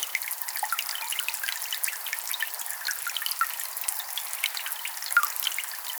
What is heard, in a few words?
dripping water ambience